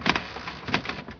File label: wood creak, materials; Garry's Mod; hl2